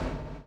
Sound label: CHAIN; Spectrasonics - Backbeat